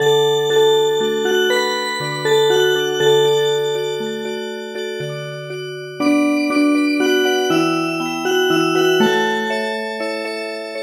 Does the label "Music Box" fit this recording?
Yes